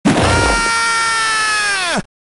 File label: aaaaaaaaaaaaaa; goku